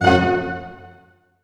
STAC C, ORCH STAC; ORCH STAC.-M

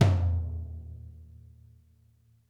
Interactive Drum Kits R; Sonic Reality; 90s sample cds

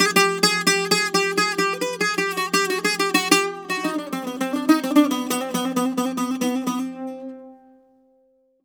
BOUZ C-1, bouzou

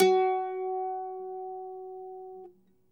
MNDLN F#3, American Heartland; Sonic Reality

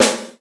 GATE SD, Drums Acoustic; USB Soundscan vol.10; AKAI; 90s sample CD; GATEKIT 2

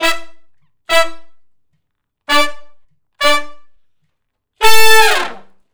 R&B Riff V. IV, I (A) unison, Horncraft; Crimson Blue and Fabulous Horncraft 4 RnB; Sections

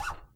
NOISE, ACOUSTIC BASS; Masterbits CLIMAX 7

